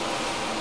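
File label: steam Jet loop, vehicles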